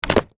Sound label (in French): open malette; La-Fabrik